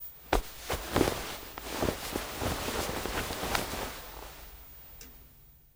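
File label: Drape Heavy Large Movement; flags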